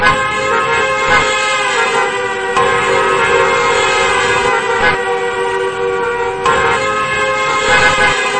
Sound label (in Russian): povtoryayushijsya zvuk dorozhnogo dvizheniya s bolshim kolichestvom mashin